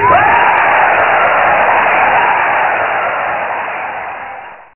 hole in one; GopherGolf

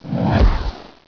Torpedo; bugs

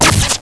Space Shot Burst; Ares™ Sneak Peek Demo

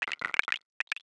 drop; GNU Backgammon

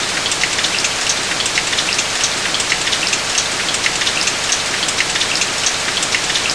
rain

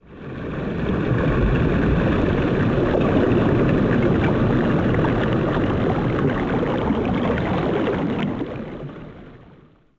wave overhead; DAoC

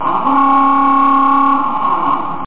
ALARM; da capo vol1; instruments

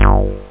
analog; da capo vol1; instruments; synth; bass

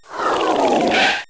Zoom; Treasure Galaxy (1994); Learning Company

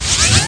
hasted; Nethergate Demo

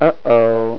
lose

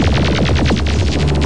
Blow Torch; Worms DC; WORM-SAMS

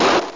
pistol; Stack Up; arnie2

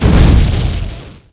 Missile Wall Hit; Defiance

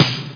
kit snare [D2]; Star Trek JR Audio

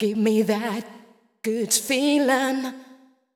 VOICE GRL HOUSE 125 A, House Voice

